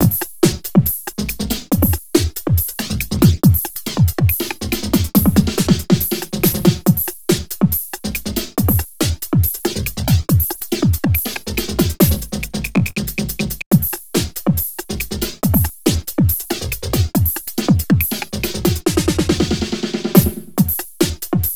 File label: Beat, Drums & Percussion; Electrocution; Beats